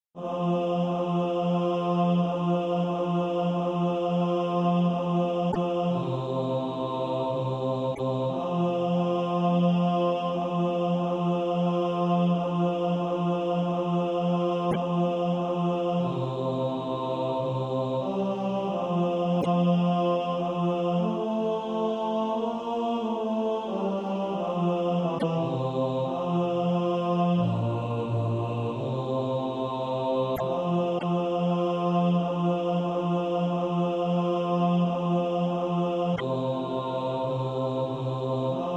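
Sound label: bass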